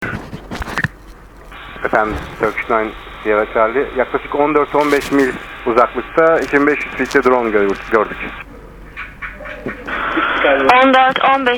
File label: drone; THY PİLOTU ‘ DRONE’ İLE KARŞILAŞTI!; Atatürk Havalimanına iniş için alçalan THY uçağının pilotu yakınlarında